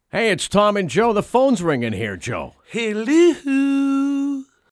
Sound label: RINGTONE